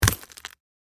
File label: Footsteps on broken glass shoes single step; impacts; gfxsounds